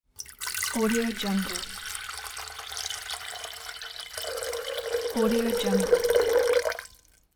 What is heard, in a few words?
pouring water